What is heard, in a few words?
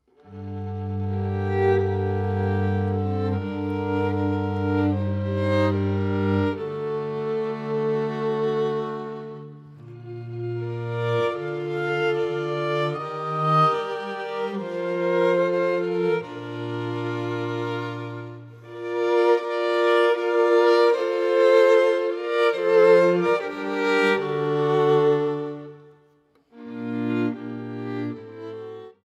Lute Lullaby; midnightclear; Lute Book Lullaby